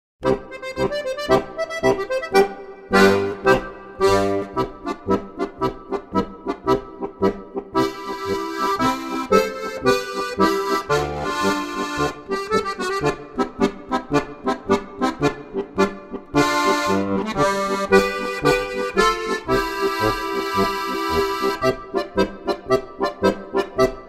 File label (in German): marsch